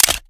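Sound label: pistol reload fast mags large mag out; gmod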